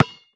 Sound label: Street Ball Bounce Concrete